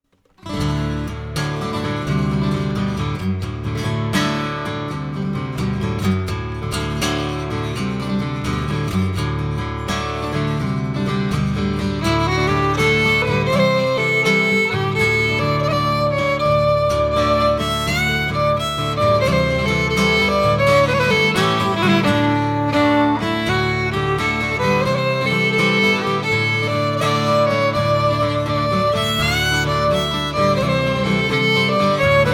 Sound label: Polka, guitar